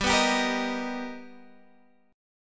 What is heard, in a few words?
B Augmented Major 7th G, chord